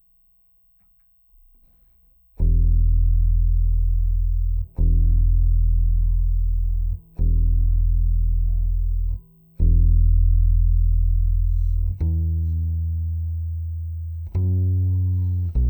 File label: C Bass DI